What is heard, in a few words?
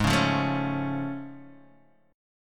A Augmented Major 7th G sharp